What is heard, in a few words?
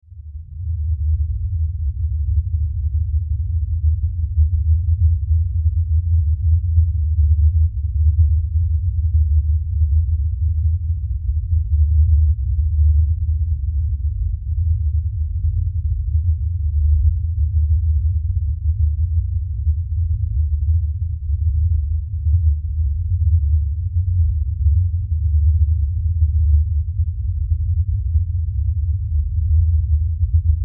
Science Fiction Drone Deep Rumble; Sci Fi Sound Effects; Sci-Fi (Sound Effects:Science Fiction); SFX Bible 6